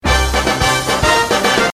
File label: whiplash